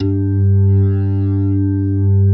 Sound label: G2, PERCORG; Sonic Reality; Vintage Timetraveler